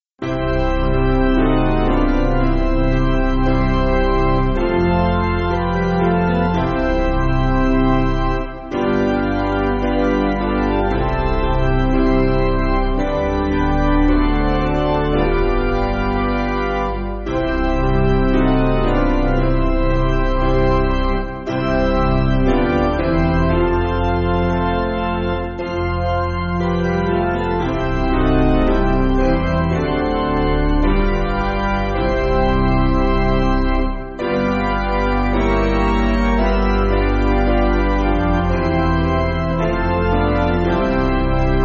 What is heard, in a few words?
Piano, Backing Files for Churches